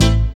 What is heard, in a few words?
SYN PIANO, dance synth; Sound & Vision - Gigapack I CD 2 (Roland)